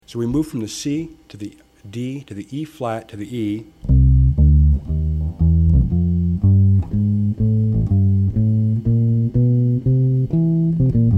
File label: blueswalk